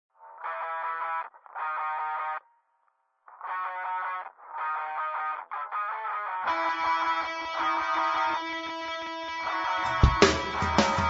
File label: mod rock; invincible